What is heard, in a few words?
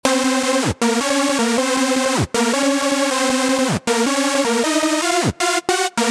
Lead; PatchFactory